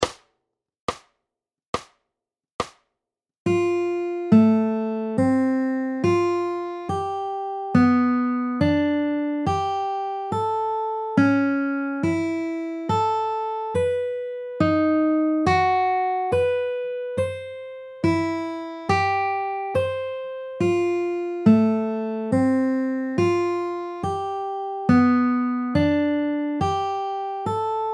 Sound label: Progression 3 FING ARP; bar chords